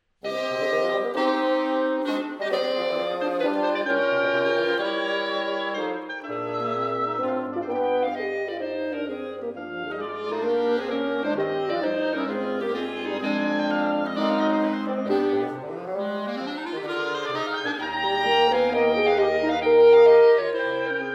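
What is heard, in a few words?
wind quintet; karg elert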